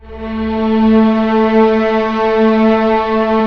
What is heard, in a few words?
STR A2 PP, STRNGS; Sound On Sound - Peter Siedleczek's Orchestra